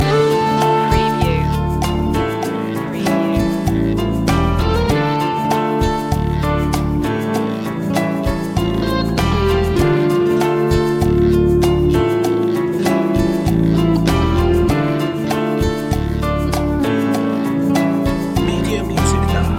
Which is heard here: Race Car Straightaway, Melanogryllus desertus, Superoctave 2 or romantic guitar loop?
romantic guitar loop